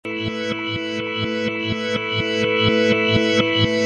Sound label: 125 reverse guitar